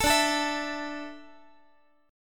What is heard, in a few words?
D Suspended 2nd D down Guitar, chord; strummed